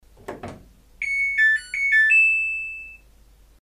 zvuk privetstviya samsung stiralki

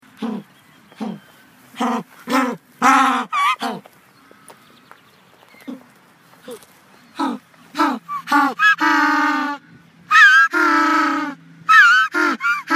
pingvin

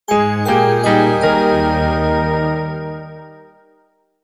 logo zvuk

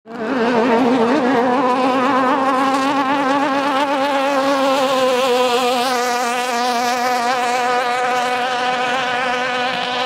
gonoch lodka mimo

